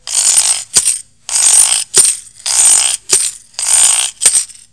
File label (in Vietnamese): Sênh tiền